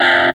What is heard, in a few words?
GTR, Grunge Hip Hop; USB Soundscan vol.34; AKAI; RNB 101